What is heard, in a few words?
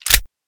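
slide back, vp70; svencoop; ins2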